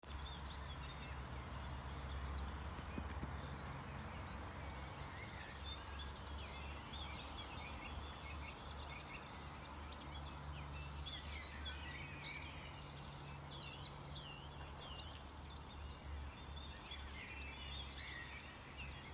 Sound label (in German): Voegel